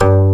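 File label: gayageum A2, Korean; AKAI S6000 CD-ROM - Volume 1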